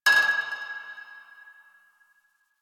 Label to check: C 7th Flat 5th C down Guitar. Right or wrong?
Wrong